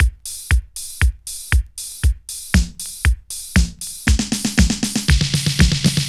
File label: old dusty bassfish, Drum loops, Electro